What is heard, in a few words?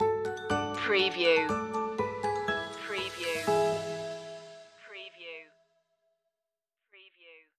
bell shoppe stinger